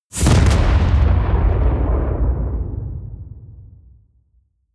detonation

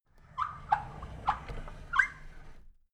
drawer big squeak open soft

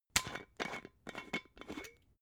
glass bottle massive open slow

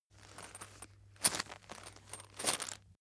chain mail movement

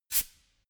pneumatic pump short

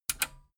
metal button radio click disable fast

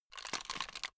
plastic rattle fast short

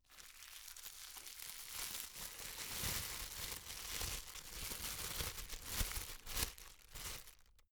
plastic bag unfold slow

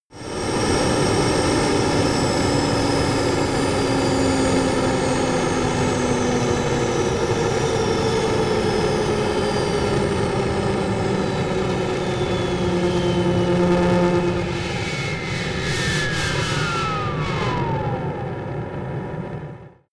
military jet fa-18 hornet turbine slow down

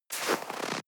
step snow walk single

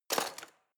knives wood drawer place hard

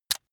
razor rickety fold out

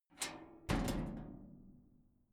metal gate latch hit

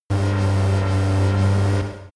space driving construction machine hard

